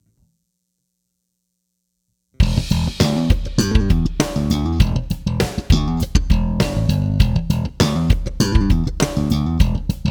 tap slides

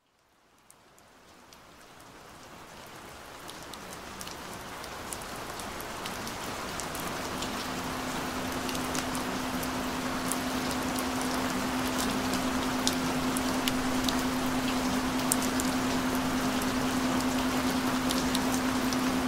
Gentle Rain Sounds; Root Chakra Healing Balancing and Opening

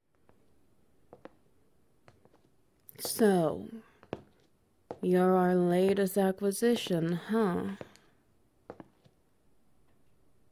Wet Noises

